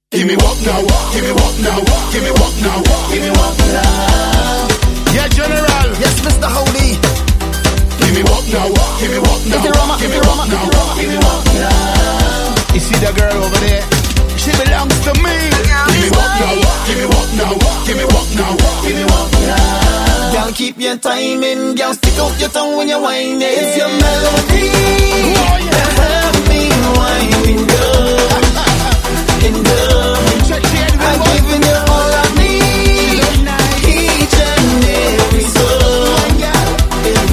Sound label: melody, soca